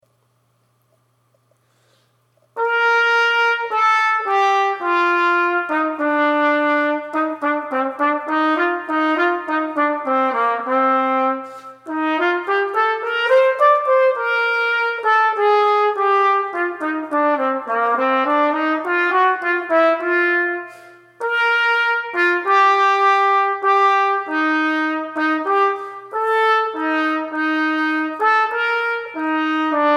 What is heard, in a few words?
Honor Band; 7th Grade; plains-junior